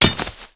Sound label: knife dirt; Call of Duty Modern Warfare; physics